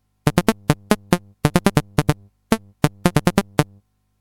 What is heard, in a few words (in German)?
Flugbegleiter Bass knackig